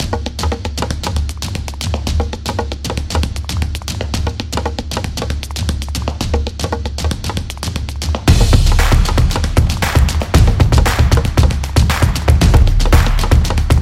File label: A Drumming Beat; high energy